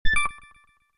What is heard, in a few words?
Menu SFX: Go Back